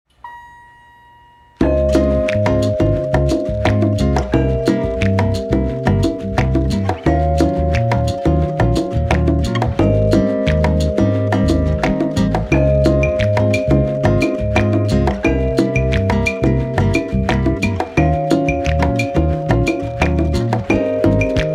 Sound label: elevator music bossa nova background music; 60s